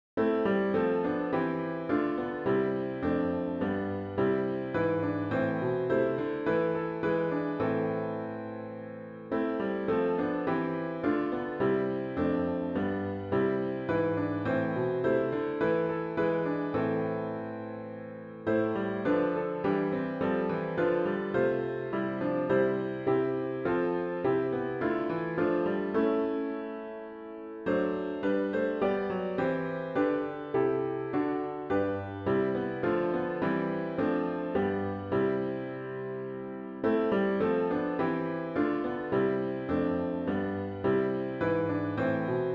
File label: piano only; O Sacred Head Now Wounded